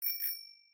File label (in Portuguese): Buzina de bicicleta